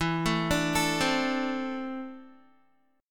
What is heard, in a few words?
E 7th Suspended 4th Sharp 5th, Guitar; E7sus4#5; arpeggiated; 12,12,12,14,12,x